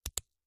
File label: finger break, body